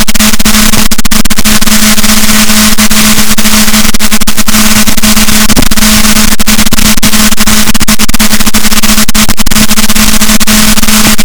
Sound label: rorquals, Balaenoptera Physalus, boat; Chile; SNR -6dB